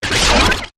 Quick Chaos, Taylor Grand

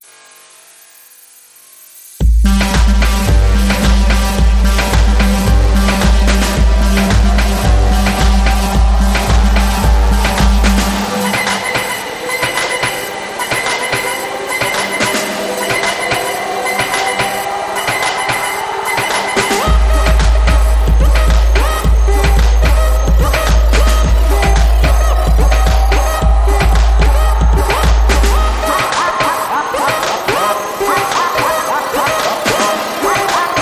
Dub

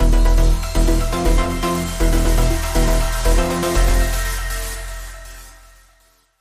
Link Sting

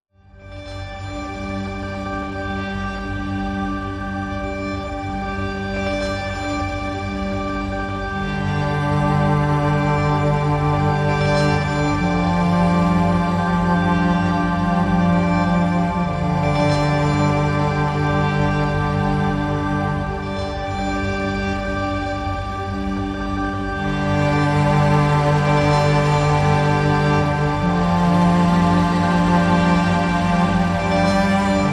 Strings

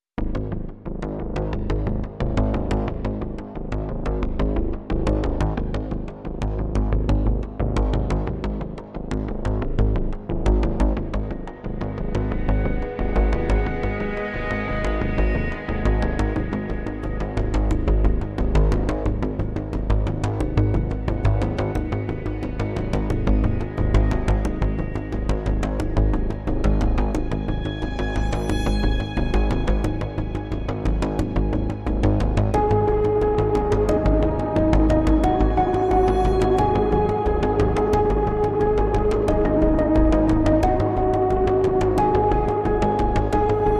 Frozen String Ambient

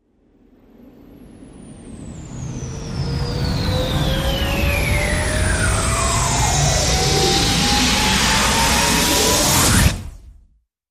Rise Short; Not Your Day

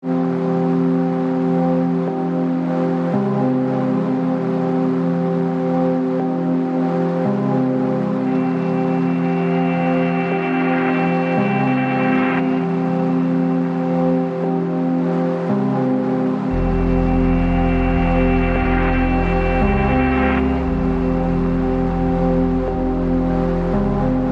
Streamwalk, No Piano

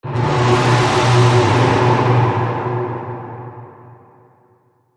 Distressing Call

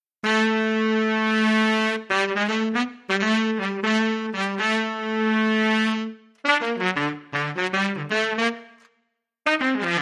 All Horns

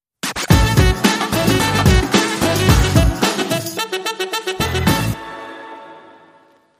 Sax

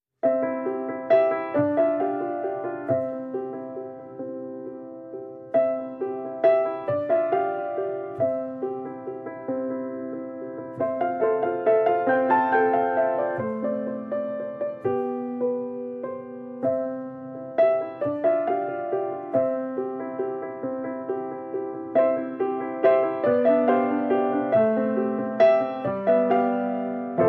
Piano

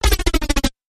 Quick Stutter Drop

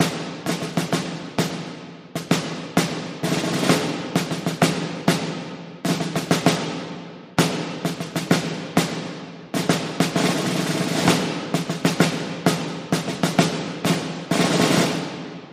Toy Soldiers Drum Roll

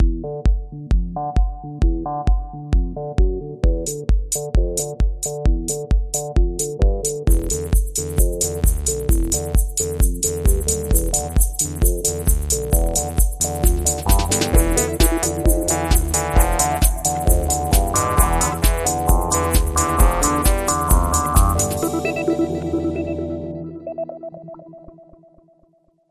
Lounge

